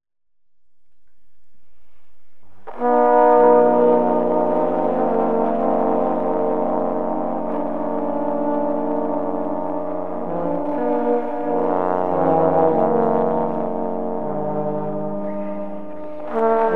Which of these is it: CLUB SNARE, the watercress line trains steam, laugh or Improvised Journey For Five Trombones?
Improvised Journey For Five Trombones